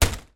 household unit buffet door glass panel shut hard

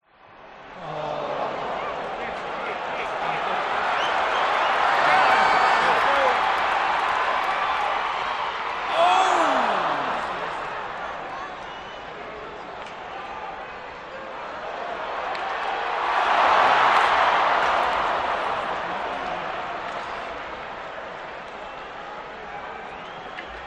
crowd reactions mixed large stadium ice hockey

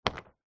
hospital xray sheet place on light display

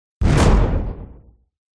impact slam hit hard; sound design

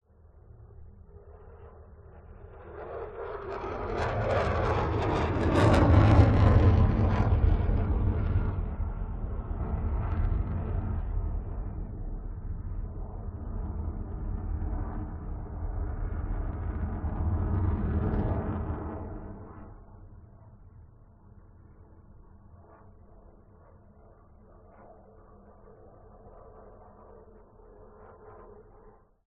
f 16 pass by contact mic ortf; Sound Ex Machina

